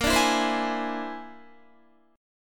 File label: A sharp 9th Flat 5th A sharp, Guitar; strummed; JGuitar